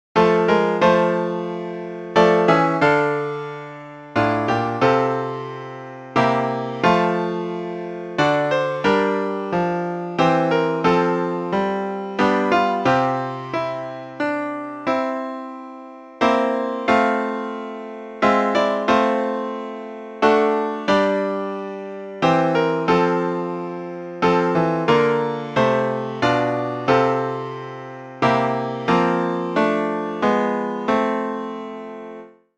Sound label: SATB MIDI